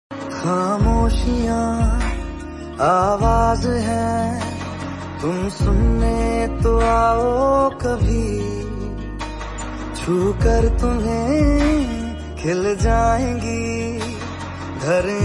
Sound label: Bollywood Song Ringtone